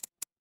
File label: office mouse click